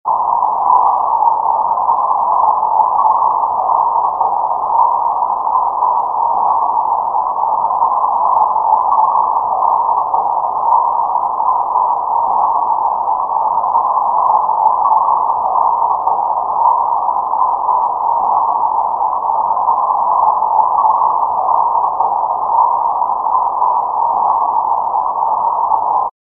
harmonic noise; sphera

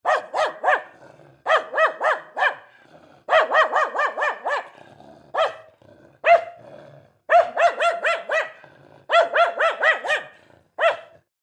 dog barking

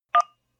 landline dial single beep

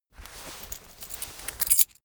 key ring mid size pocket fetch

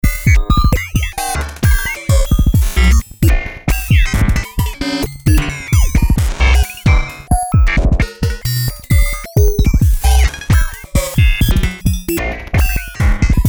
beat electric randomized

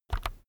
keyboard external key press fast soft

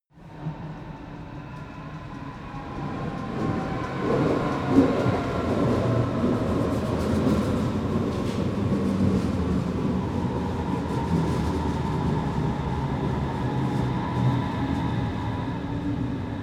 train underground station arrive

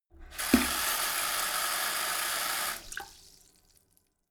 sink fill splatter hard short

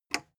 plastic button small simple push

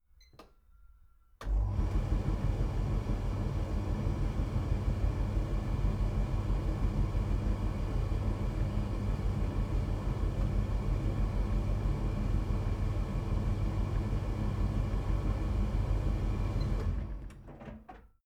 washing machine tumble drier work

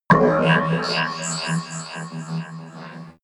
mechanic alien

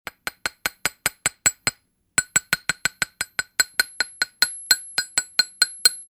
hammering

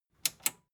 metal button tiny click disable slow

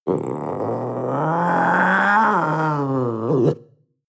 panther young growl

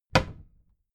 cabinet door close heavy

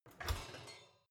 oven open baking sheet ringing

